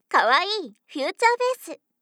kawaii future bass

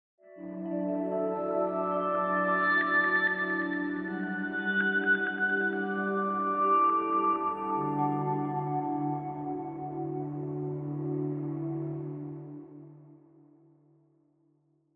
Jingle

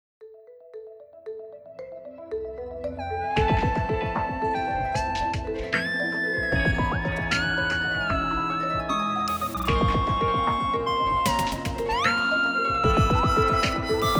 Off Vocal

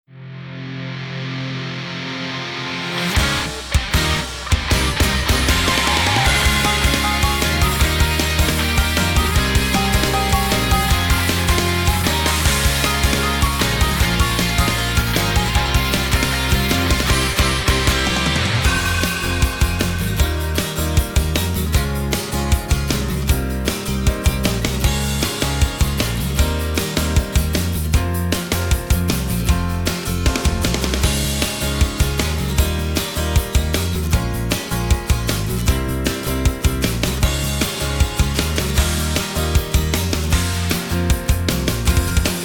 Off Vocal

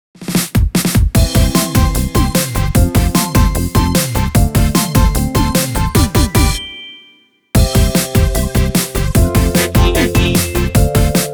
karaoke

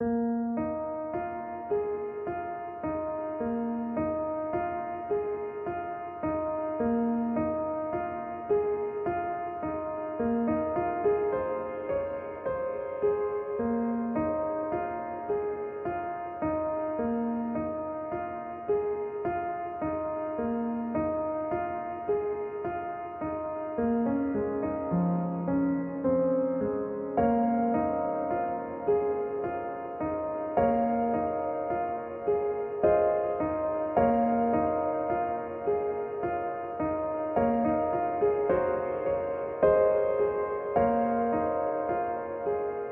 BGM

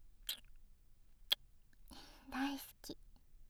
kiss, ASMR; 大好きづくし

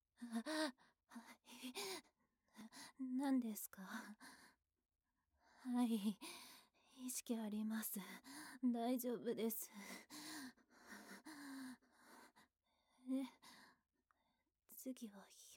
nonstop, ASMR

